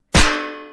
hitting

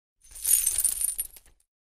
jewel sound effect commercial

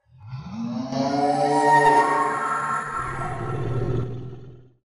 screech; wendigo

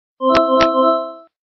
notification; pesterchum

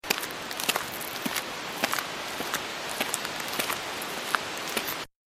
walking with wind

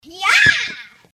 yaahhhhh

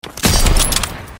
headshot

